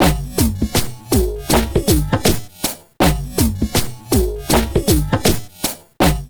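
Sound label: drum loops crazy processed, 80 bpm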